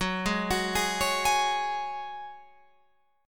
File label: Gb Suspended 2nd, Guitar, Open F; arpeggiated